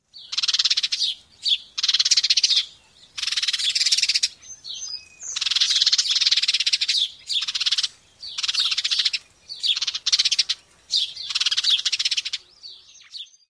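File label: liquid chatter and other calls, bird; NY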